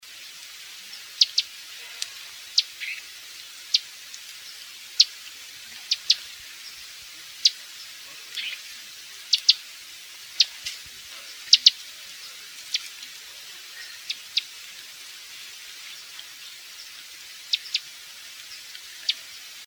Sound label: Wrens Kinglets Gnatcatchers; SEWR; Olmstead